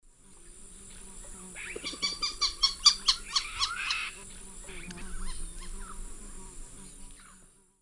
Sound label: Jacana jacana, flight calls; S. America; Eastern Lowlands